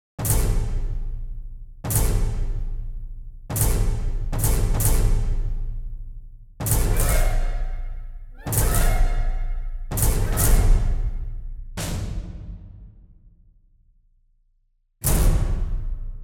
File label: Percussion; ProScores